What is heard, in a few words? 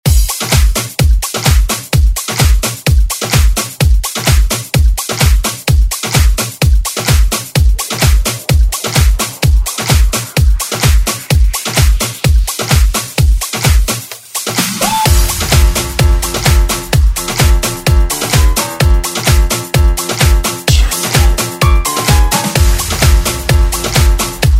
House Redrum